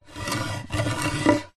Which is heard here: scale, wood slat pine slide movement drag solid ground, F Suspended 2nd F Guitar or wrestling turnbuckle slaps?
wood slat pine slide movement drag solid ground